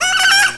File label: AYAAAAH